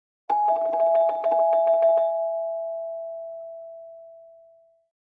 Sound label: doorbell sounds; nonstop bell